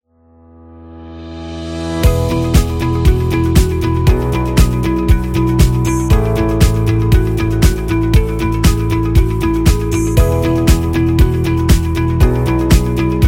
Business Inspiring; friendly

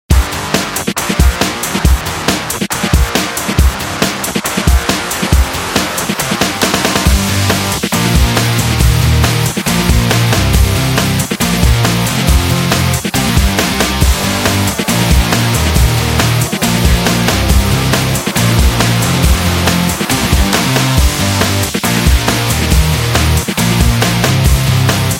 Rock Punch

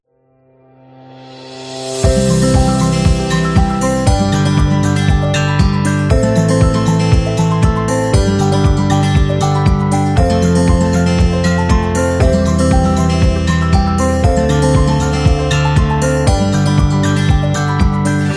Instrumental Happy

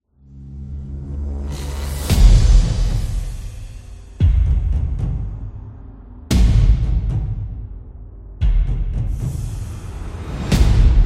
Battlefield Music

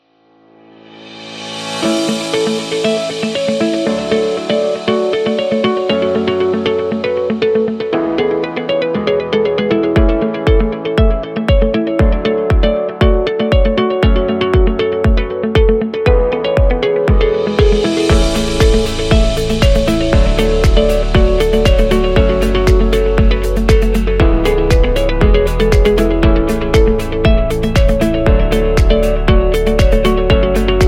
Corporate Upbeat

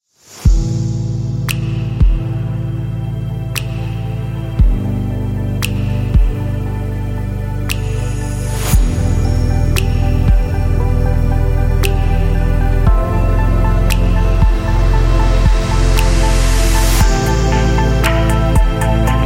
Abstract Soft Presentation, atmosphere music

